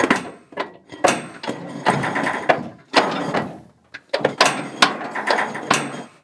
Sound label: turret, tanks; World of Tanks